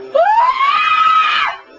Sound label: scream